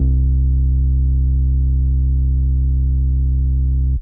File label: Synth Prophet Bass C; Electronisounds